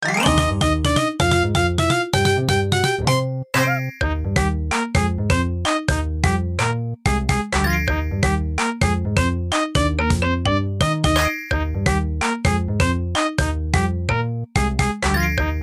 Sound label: bgm battle